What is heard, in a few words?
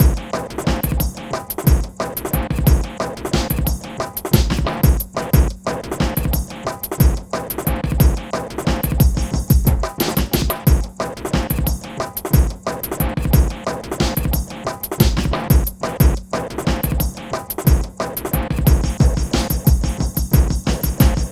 Processed Beat, Drums & Percussion